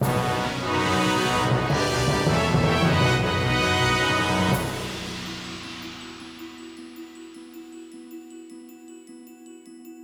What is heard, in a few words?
Dungeon Floor Clear; The Nightmare of Druaga Fushigi no Dungeon; PS2